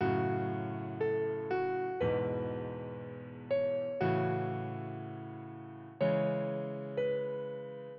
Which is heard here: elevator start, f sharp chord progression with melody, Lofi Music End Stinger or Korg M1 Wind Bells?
f sharp chord progression with melody